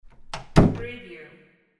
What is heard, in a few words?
door close